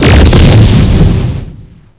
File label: gauss, weapons